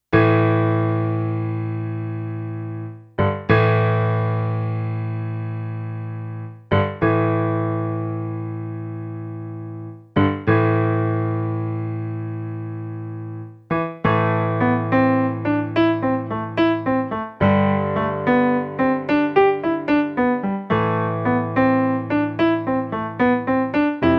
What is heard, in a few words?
Celtic Drums and Pipes